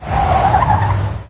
Vehicles

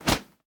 flip, Insects

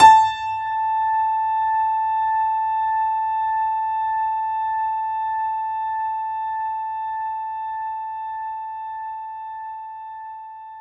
Grand Piano A5

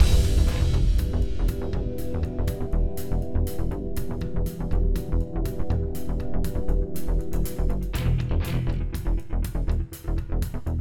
Warsaw Combat loop; Clandestine

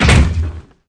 Footsteps; SFX Character

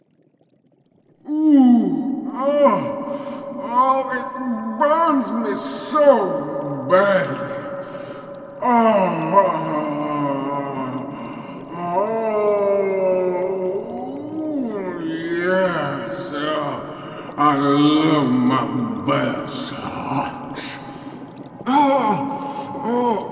Voices Screams

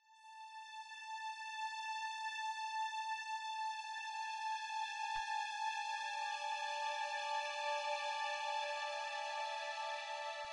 sounds music; Halo Combat Evolved; Xbox

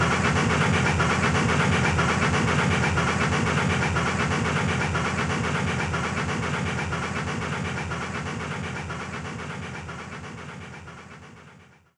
Train Engine Loop; The Polar Express; PS2

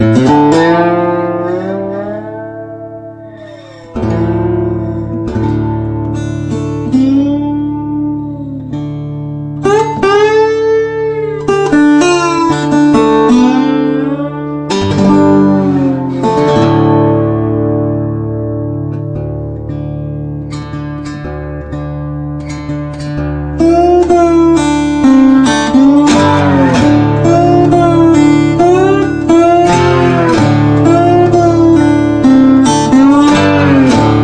Solo Guitar; Rage; Westar

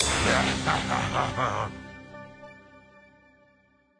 ui premium; Dungeon Legends; Android

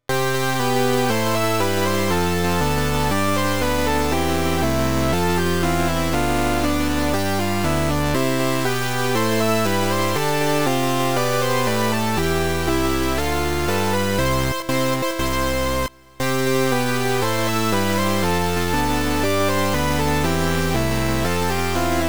Battle Mode Win; Battle Lode Runner; turbografx 16

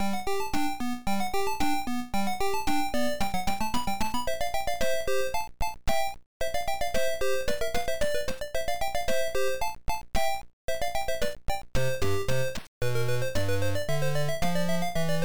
Round BGM; super boy 3; msx

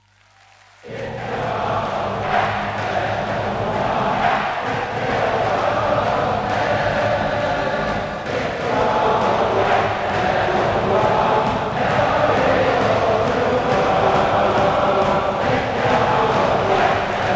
Chants, Germany

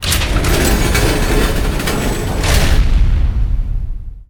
Lift Fence Up Down

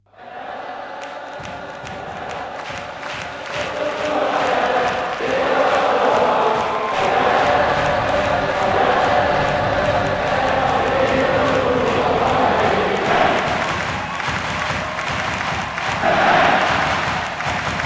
Chants - European Type

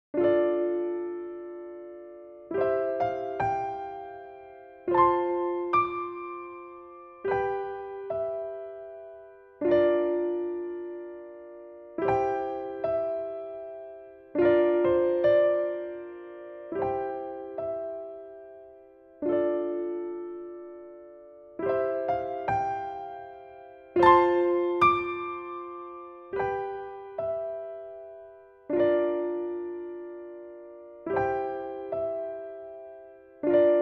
~Piano Arrange~; the legend of zelda skyward sword expanded

